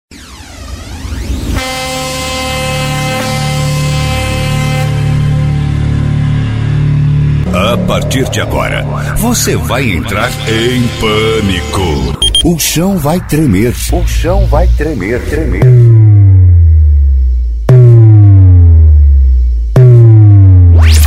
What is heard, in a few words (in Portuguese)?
abertura funk bass; studio auto som